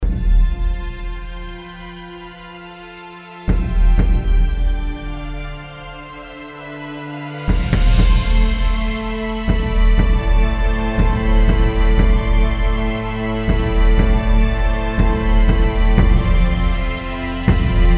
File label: drum